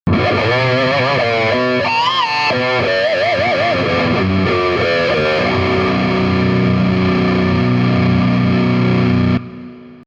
metal zone

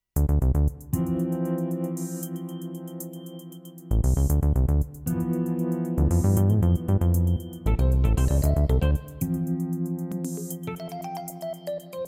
fp 5 ep

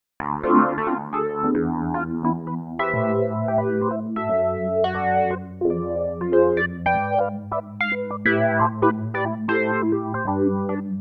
v-synth gt v2 r b hiphop

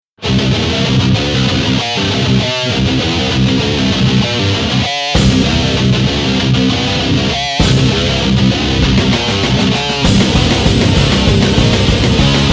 patch demo

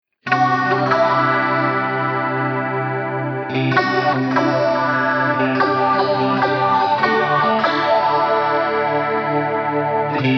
multidimensional

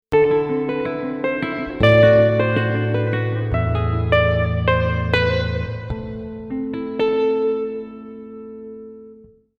vg 88v2 nylon

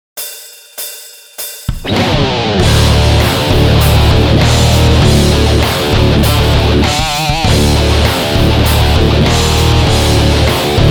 patch demo

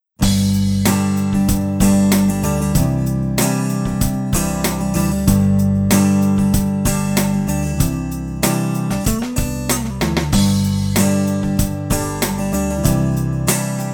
sound check

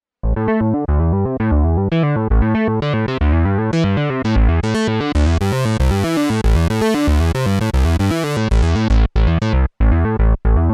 Deep Bass

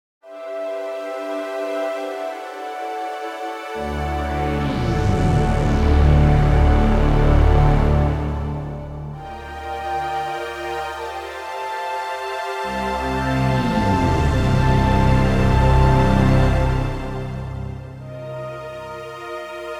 dream drone; Jupiter-80 v2

